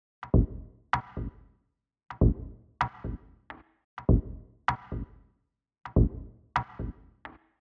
Tom Perc

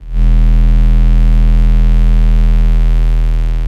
bass 808 E

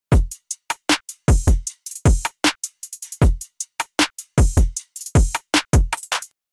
Hiphop dry

